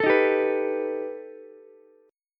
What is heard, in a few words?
B Augmented 7th B down Ukulele; strummed; JGuitar